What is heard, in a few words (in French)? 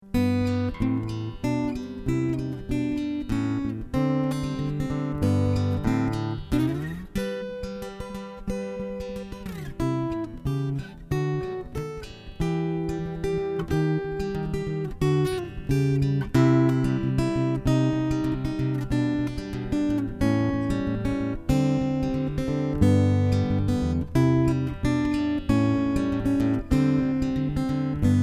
Guitare voix; Solo